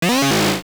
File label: Boss Hit, Dungeon boss getting hit; LOZFDS